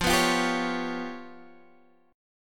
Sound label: G 7th Flat 5th